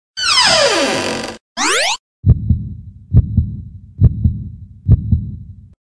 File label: Heart Beats